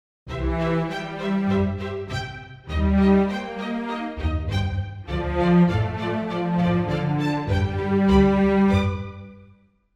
BBC Strings Main; Spitfire Audio

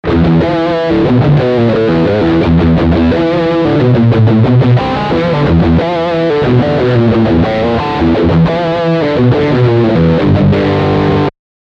Riff Humbucker

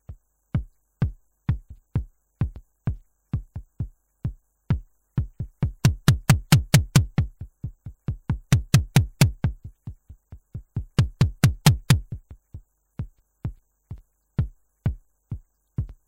Simmons SDSV bass factory

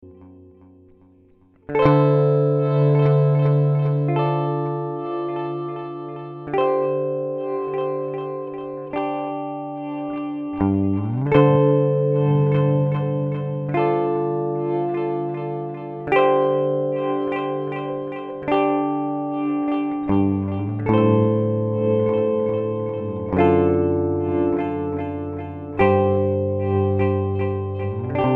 Boss DD 200 Ducking

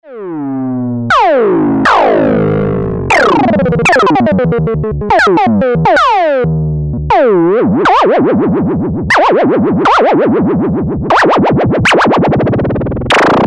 VCO Mode D LFO Sweep

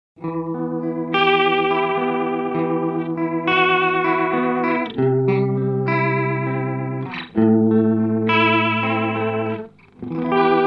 Echo Shifter Analog Fast Modulation; Ibanez

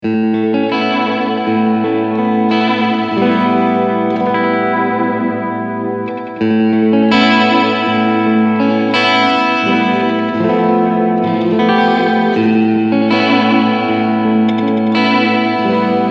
Short Delay

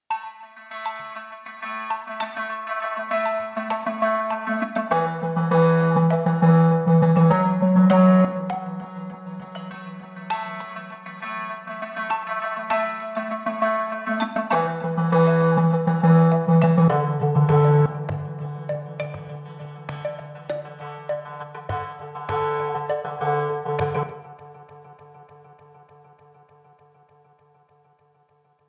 Motors; Umlaut Audio

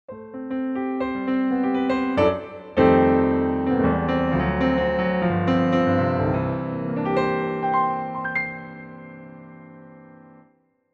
Yamaha Genos2 CFX Concert Grand

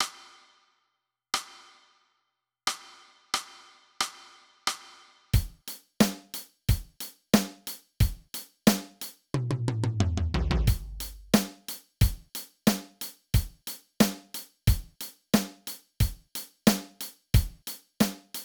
raw drums

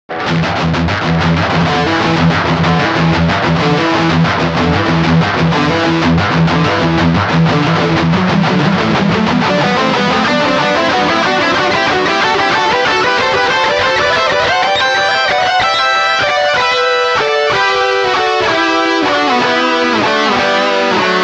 Lead Boost

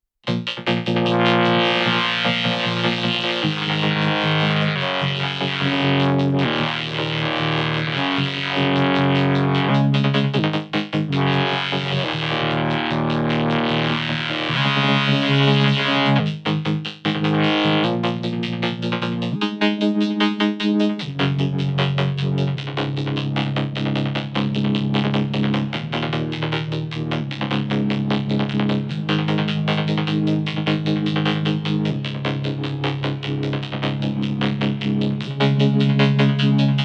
Monotribe vogoned; JD Vogone

